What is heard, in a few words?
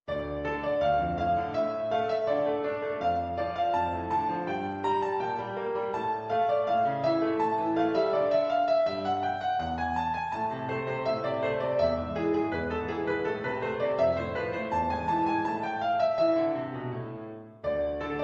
sonatina D min